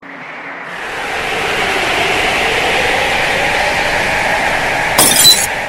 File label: tornado; ceifa